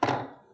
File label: Knock